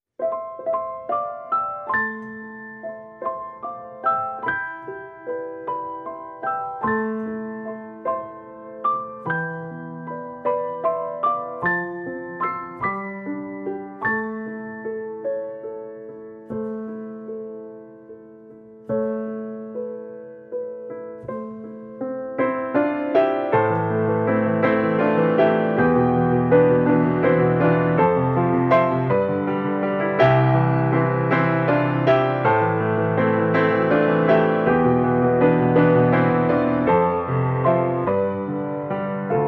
Piano

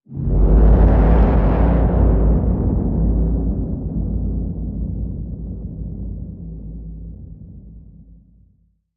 Hit Tonal Ripper

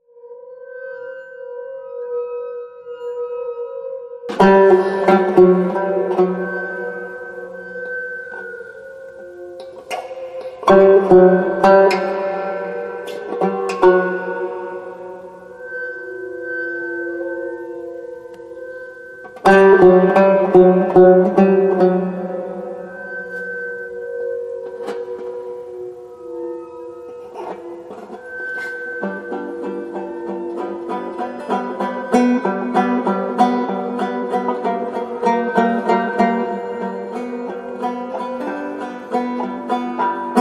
Suspense

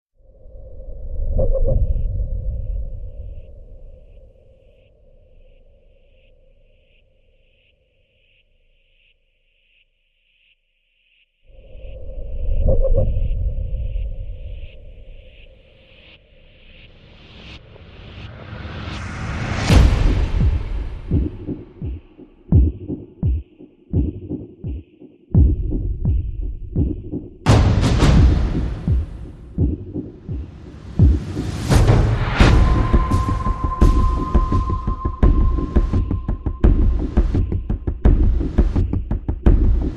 Drums Regenerate

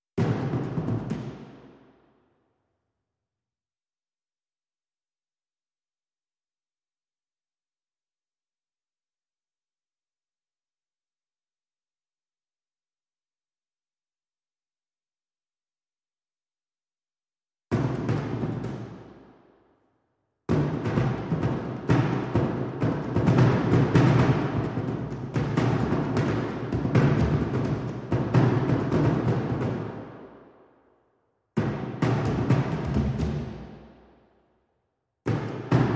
Track Layer Dhol Hand

